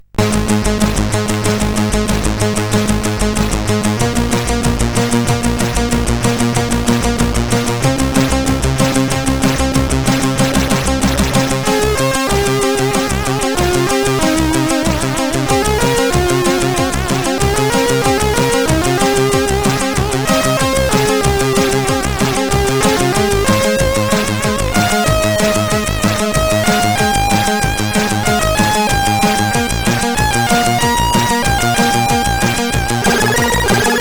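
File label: Ordeal, MOS 8580 SID chip music, games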